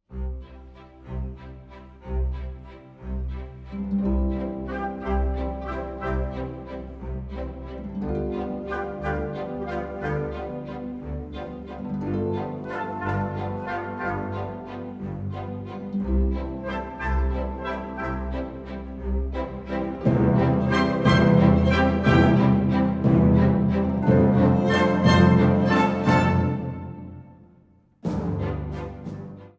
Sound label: piano